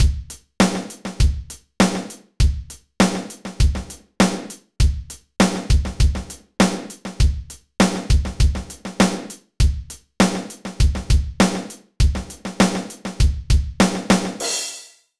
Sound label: drum ghost